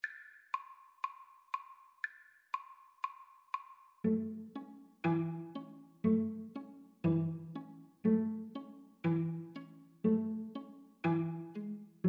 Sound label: String trio